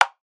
Wood; WoWInterface; Plater

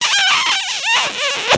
big kiss; WeakAuras; PowerAurasMedia

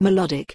melodic; WoWInterface; DBM-VPVEM

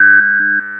blip; WoWInterface; DBM-Core

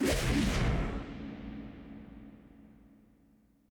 threat warning; WoWInterface; Details

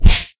Sharp Punch; WoWInterface; WeakAuras

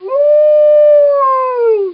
wolf; WeakAuras; PowerAurasMedia

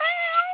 Kitten Meow; WoWInterface; WeakAuras

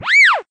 Banana Peel Slip; WoWInterface; WeakAuras

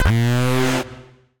Alert; DBM-Core; BlakbyrdAlerts

